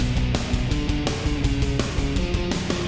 Boss Fight Phase 2 MIXED

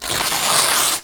cloth tear

